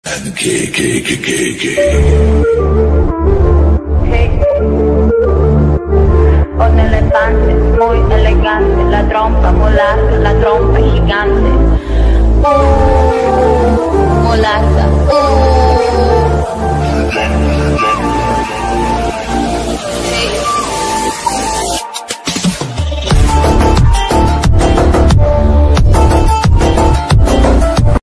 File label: danger music ringtone